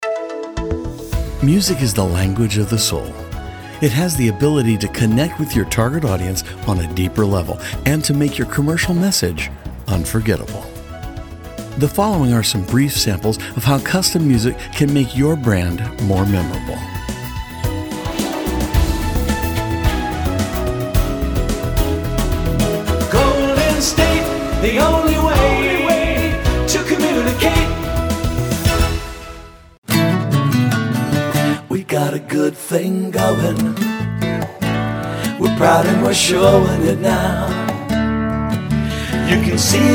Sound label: Jingle